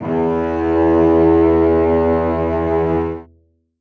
string acoustic, cello

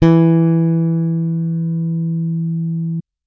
bass electronic, electric bass finger

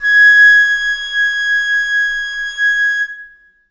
flute acoustic

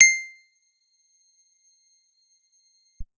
guitar acoustic, acoustic guitar nylon

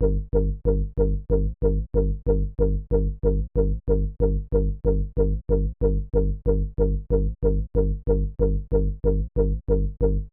bass

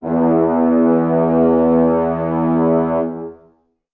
brass acoustic, muted trumpet

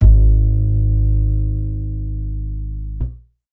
bass acoustic

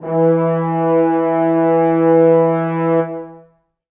brass acoustic, muted trumpet

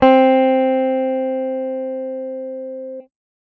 guitar electronic, clean electric guitar

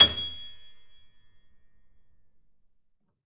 keyboard acoustic, acoustic grand piano

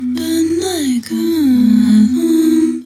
ban nigh ka, vocal

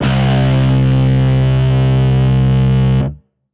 guitar electronic, distortion guitar

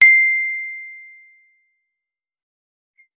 guitar electronic, clean electric guitar

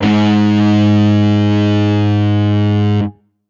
guitar electronic, distortion guitar

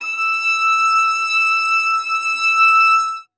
string acoustic, cello

